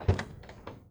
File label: Ferrari Testarosa Door Open; Automobiles